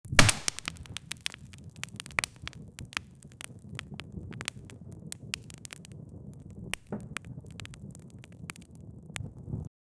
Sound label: fireplace with wood crackl